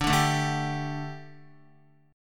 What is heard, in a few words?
D Suspended 4th D, down, Guitar; strummed